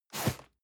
cardboard box medium slide short hard; objekte

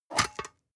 storage lid slide open hard; objekte

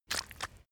step mud slow normal

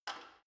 gym bicycle saddle unlock soft

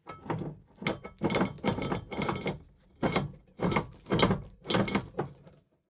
drawbridge winch hoist sluggish; soundpool

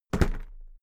briefcase plastic drop

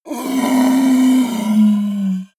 yeti groan pain cry

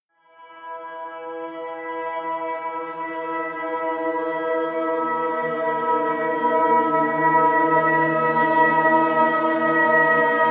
drone sinking

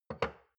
shot glass place table soft; soundpool

